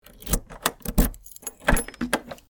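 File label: open door with key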